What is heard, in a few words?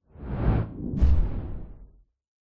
sound design cinematic whoosh into hit dull distant